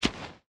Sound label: explosive inventory pickup; factorio 2.0.55